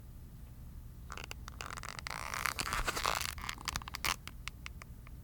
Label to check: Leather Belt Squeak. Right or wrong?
Right